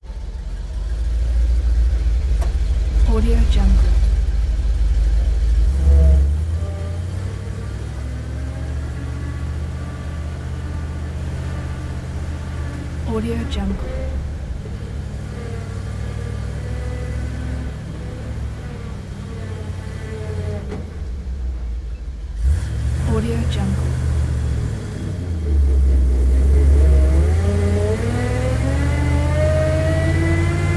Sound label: truck in garage ford f700 interior